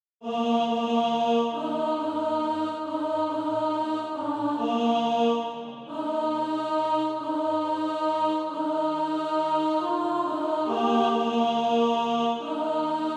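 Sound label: alto, Practise Music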